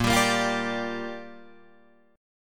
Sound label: A sharp 6th, Guitar Drop D